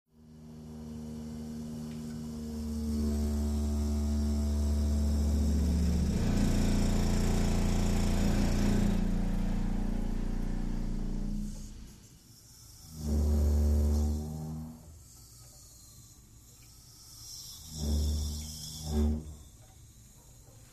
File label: Water Pipes Moaning, basement